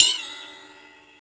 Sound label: RSS, 160 BPM